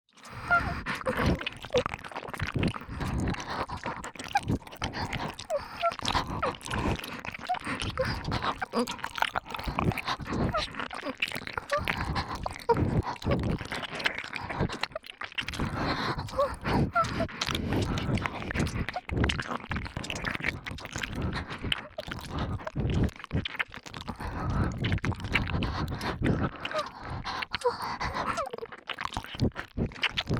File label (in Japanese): 両耳 激しい耳舐め; 白耳; くちゅ系効果音版